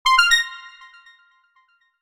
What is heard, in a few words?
retweet send; TWBlue